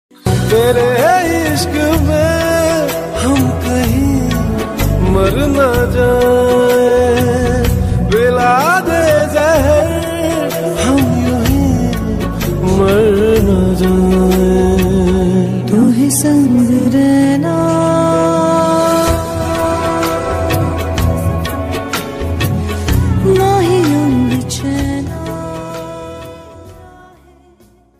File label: pakistani ringtone